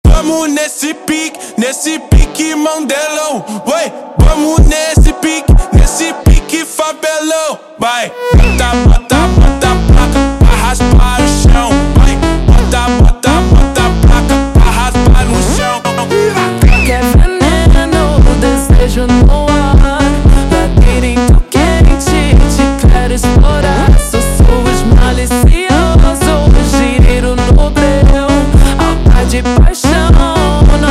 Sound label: funk